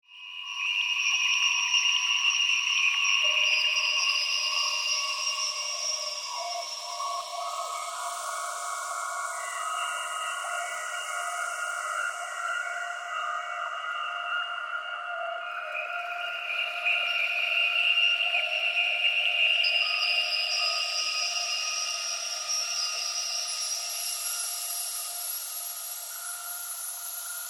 Mystical Ambience, Scifi, Mystical, Ambience, Science, Fiction; BlastWave